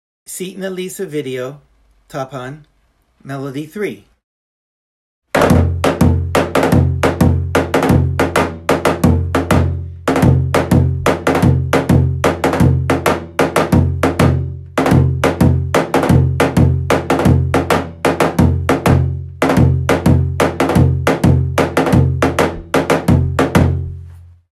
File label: tapan melody